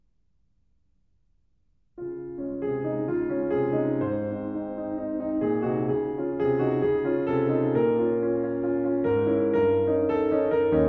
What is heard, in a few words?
Small Piano Pieces, Allegretto con moto; Grieg; The Complete Music For Piano